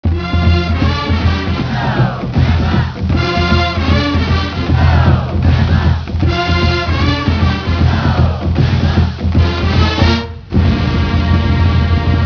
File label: Go Bama Cheer